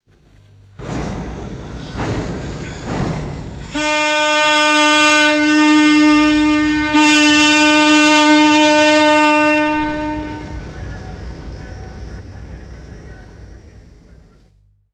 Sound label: tugboat horn blasts answered by ship; horns